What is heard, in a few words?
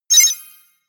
sci-fi bleep; maschinen; signale; soundpool